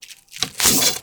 arrow grab from quiver, Medieval Weapons Sound Effects